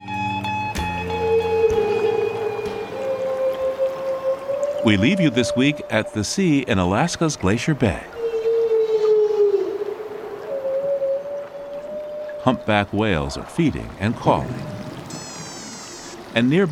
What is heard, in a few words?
soundscape